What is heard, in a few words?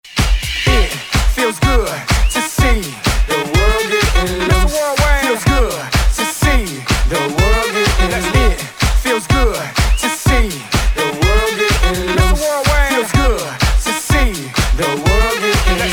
Club Party ReDrum